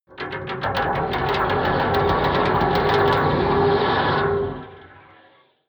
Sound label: rattling metal creak